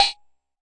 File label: Jomox MBrane, drum machines; Jomox MBrane 11